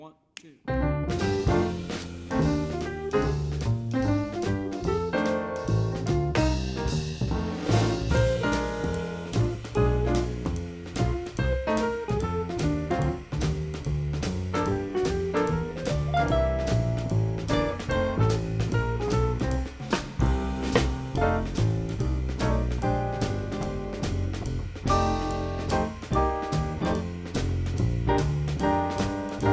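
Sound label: Jazz Rhythm; 2022 All State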